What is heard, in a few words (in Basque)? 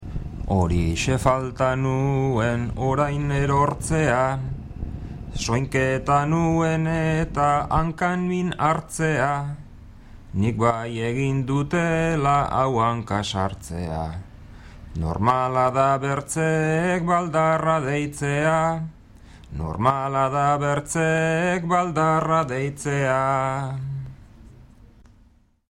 bertsos